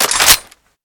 mag clip in, weapons; deluge

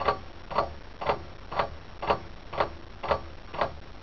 clock tick

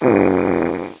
fart; csde attic

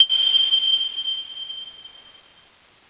bleepy

